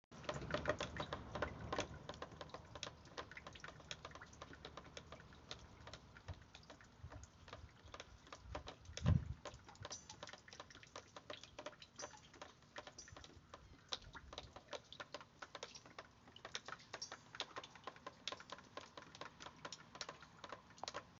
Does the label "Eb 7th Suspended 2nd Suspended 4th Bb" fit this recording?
No